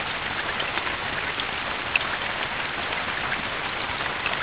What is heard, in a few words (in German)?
regen